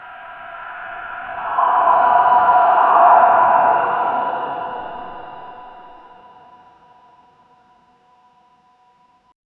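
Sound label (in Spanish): entre la estática se escuc, horror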